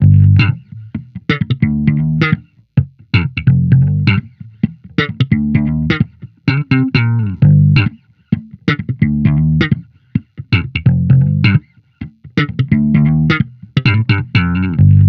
Amped loop 130 Gm, Electric Bass Loops; Slate Digital BASSHOLE Sample Pack

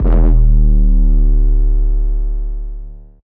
808 Bass Big N Mono Eb3; Slate 808 Deep Dive; SD Signature 808s

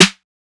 snare tight; one shots; SEISMIC Drum Sample Pack; Slate

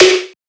snare hydro; one shots; SEISMIC Drum Sample Pack; Slate

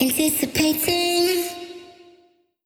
phrase Fm, Vocal; Slate Digital Omnivox Vocal Sample Pack

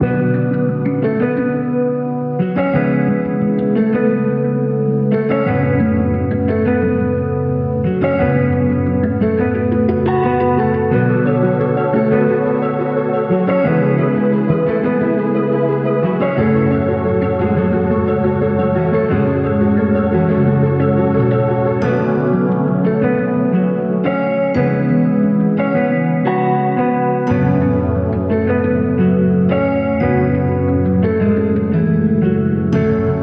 songstarter 88 F#m, Pop; Slate; SD PASADENA Pop Sample Pack

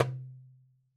darabuka Single hit; Raw Perc One Shots; Slate; SD SEISMIC Drum Sample Pack

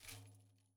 daf Single hit; Slate; SEISMIC Drum Sample Pack

